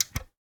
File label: Button Press, Clicks & Switches; Bart Kamski